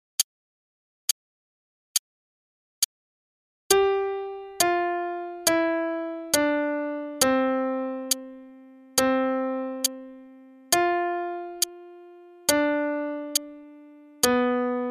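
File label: Starlit Melody, piano; Faber Adult All-in-One Book 1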